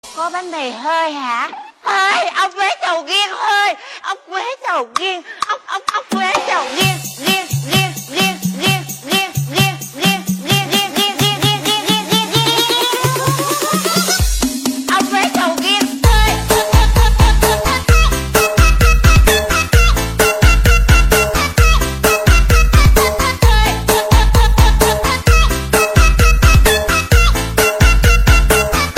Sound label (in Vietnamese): Remix Nhac Chuong